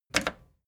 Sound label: record player play click fast